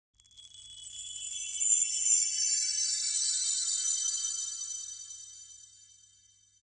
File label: Magic Tree; Seer; GamigoNA